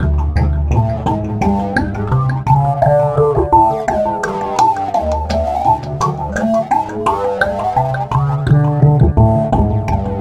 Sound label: Remixed Acoustic Bass